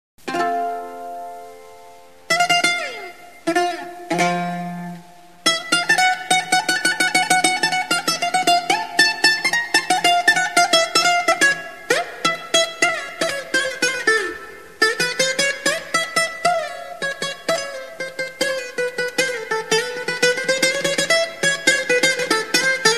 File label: bouzouki, Greece